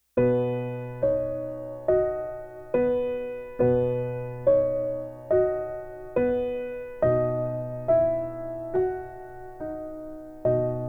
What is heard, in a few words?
Piano Version; Brother John; Tiny Tunes